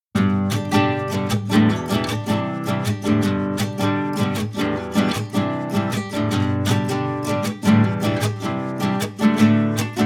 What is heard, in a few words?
background music happy